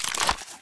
elite twirl; czero opforce

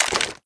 MSG90 SELECT, weapons; czero opforce

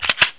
reload SPAS12; csof

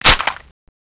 bolt release, HK416c

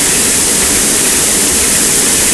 steam loop

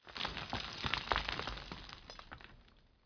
debris; cstrike; mansion

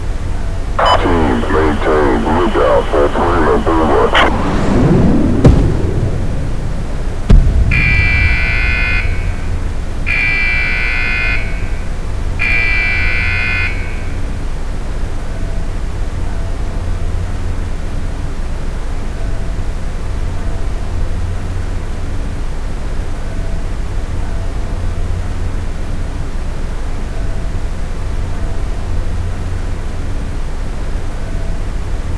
industrial; cs biodome

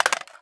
lr300 mag insert, weapons

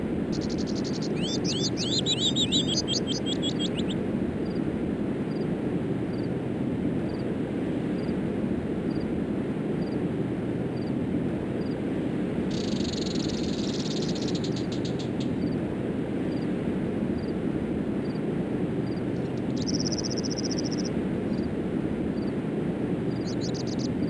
inferno ambient; de elkantara

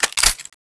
p90 bolt pull, weapons; czero opforce

